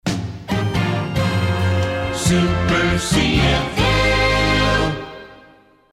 Short Fanfare Open

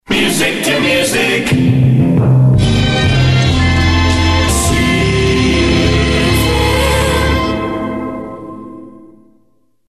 Music Trans Fast Slo; Super CFL; Imagers

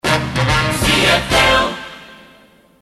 DumDaDum; Shotgun

